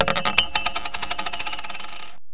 coin drop; svencoop; redempt

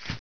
gator footstep; gearbox

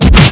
kick hit

